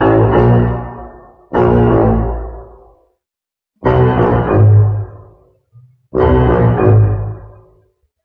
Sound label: horns, Loops